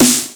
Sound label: Snare